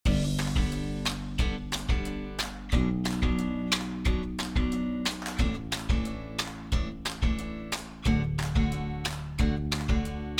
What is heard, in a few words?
Happy Background Music